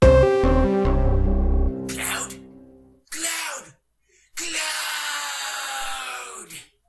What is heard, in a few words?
Caught Noise And Scream; MetalGearCloud